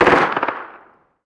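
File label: gun mp5 distant; ModernWarfare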